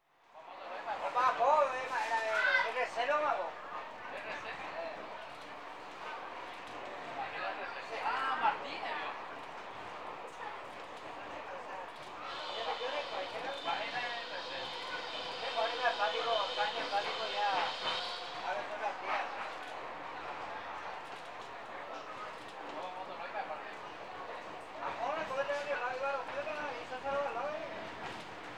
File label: Steam Train From Asuncion To Luqu; Urban South America; The BBC Sound Effects Library